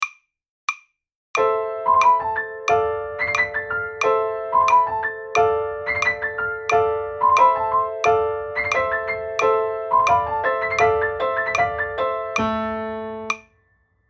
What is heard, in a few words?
romance melody